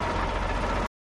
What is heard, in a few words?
Cement Mixer Loop, cement truck